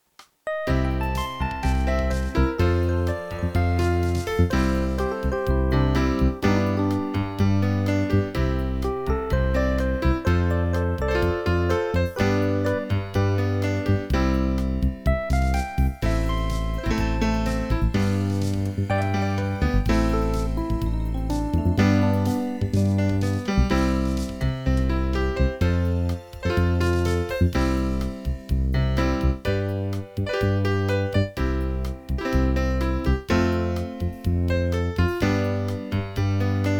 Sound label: slow pop